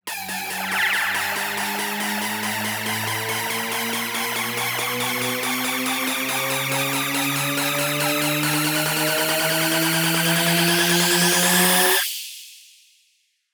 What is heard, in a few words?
Tension Uplifter 140 BPM F#; Risers; Cymatics